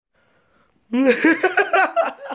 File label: laughing